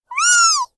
Fairy wee

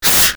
Steam short

